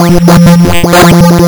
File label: lsdj waves; wavetables